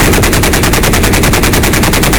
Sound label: Automatic KPVT, Weapons; DCS